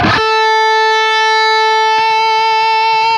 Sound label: LEAD A 3, Guitar; East West; Steve Stevens Guitar Samples Collection